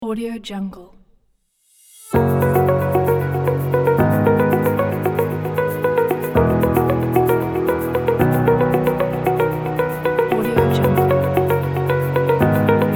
soft and upbeat corporate; nikitsan

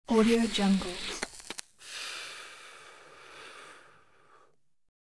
quick cigarette puffs

